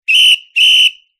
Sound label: sport referee whistle